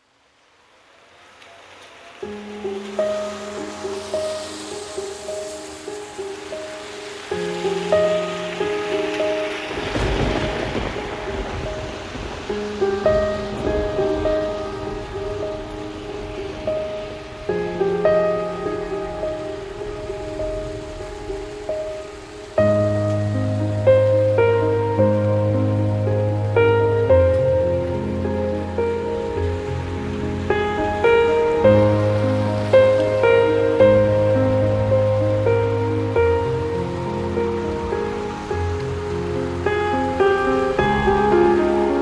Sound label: Piano and Rain